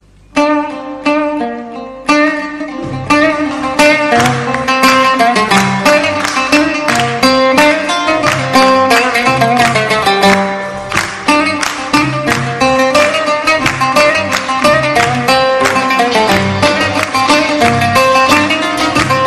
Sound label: arabic soft n slow, ringtone